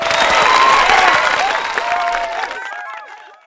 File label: sfx combo; Stunt GP